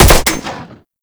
Fire Mech; Warframe; Weapons; Strun

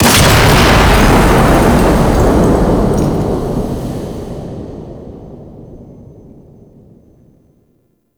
Large Power Cell Explosion; Space Trench Run; Warframe

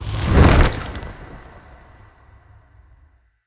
Fire Push Throw; Powers; Fenn

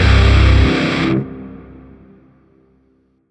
Shawzin Chromatic Chord A; Warframe; Grineer Shawzin

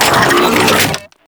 Sniper Reload; Warframe; Sentient; Crp Sent Amlg Sniper

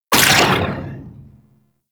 Arch Railgun 2D Mech; Warframe; Archwing

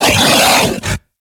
Feral Vocal Melee Attack; Warframe; Kubrow

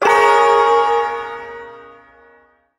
Ersatz Shawzin Yo Chord J; Warframe; Duviri

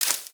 Bullet Impact Debris Grass; Warframe; Weapons; Bullet Impacts

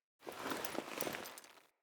Foley Move Short; Friendly; Deora

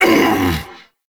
Stalker Pain Grunt; Warframe; Jade Shadows

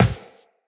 Run Default, Footsteps; Tenno

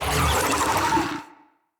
Infested Big Ashen Vocal Attack

